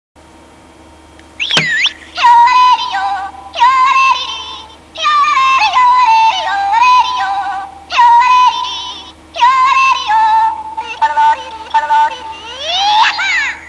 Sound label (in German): Jodel; Rodel